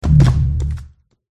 tree wound

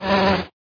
wasp attack

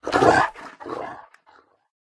dog demon attack voice up

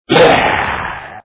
zombie attack low

